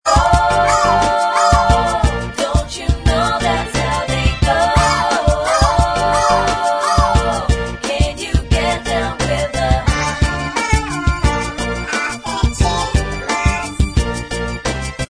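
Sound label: backing vocals, backing track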